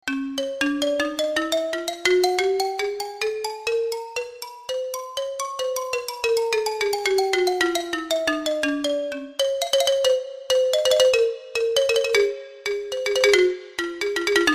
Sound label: Xylophone